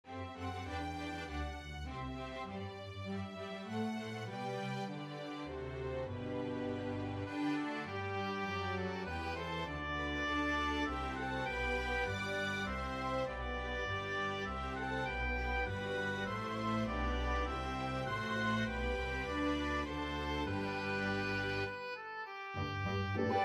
Oboe Track with Melody; The First Nowell

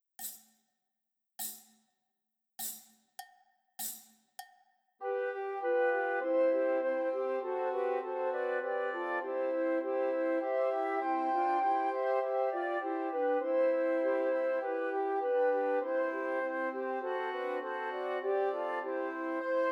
Trios for Three Descant Recorders; Christmas Trios

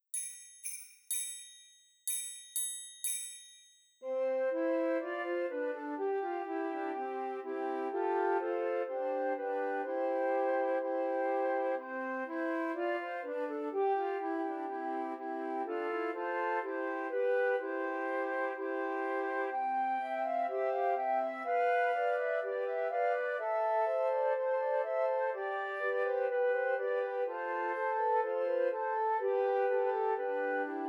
Trios for Three Descant Recorders

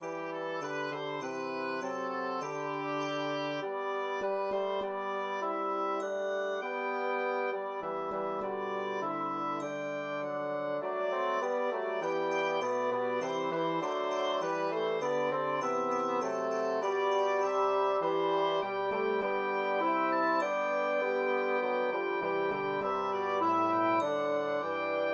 Mini Bassoon Track with Melody; The Holly and the Ivy

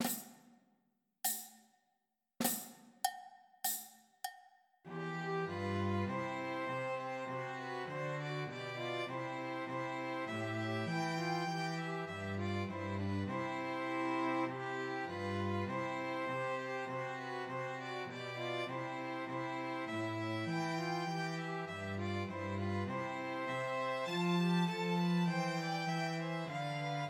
Christmas Trios for Two Violins and Cello; Once in Royal Davids City

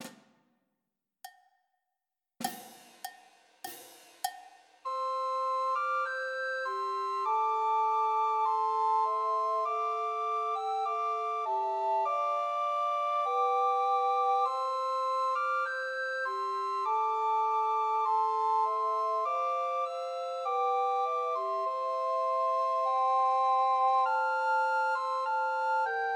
Trios for Two Descant and One Treble Recorder; In the Bleak Midwinter; Christmas

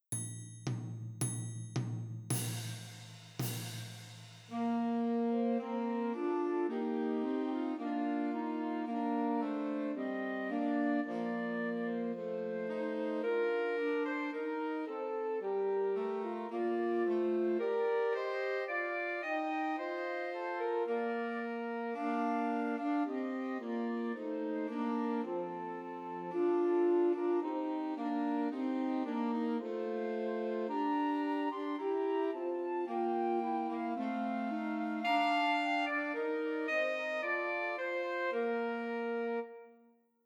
Flute Alto Sax and Tenor Sax; See Amid the Winters Snow

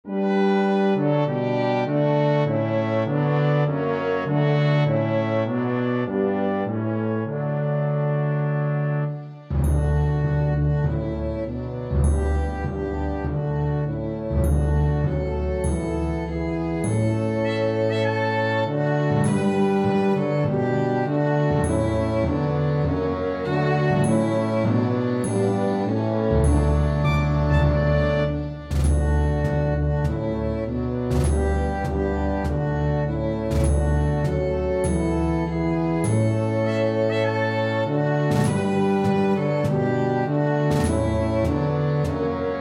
Violin Track with Melody; While Shepherds Watched

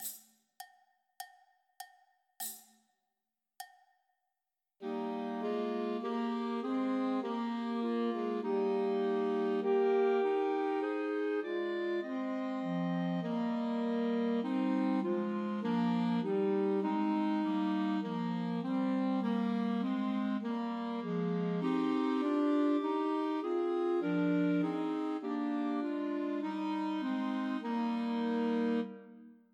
Trios for Two Clarinets and Alto Saxophone; Unto Us a Boy is Born; Christmas

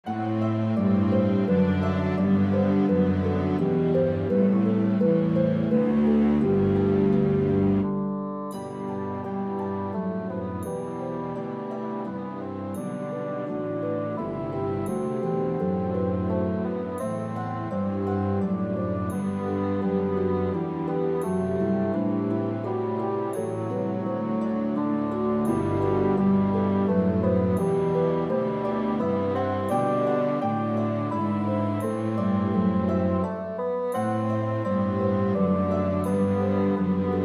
Mini Bassoon Track with Melody; Away in a Manger

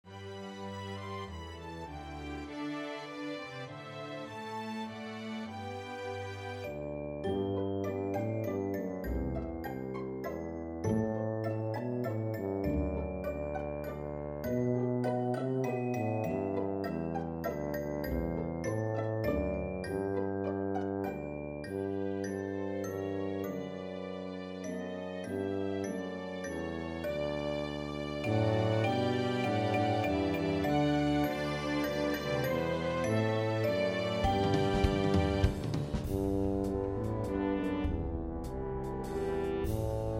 Tuba Track with Melody; We Wish You A Merry Christmas

